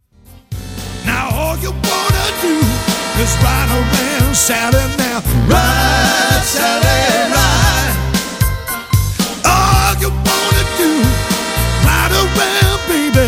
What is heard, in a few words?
Party Band; Boston; This Boston Party Band was created in 1982 and has been performing in New England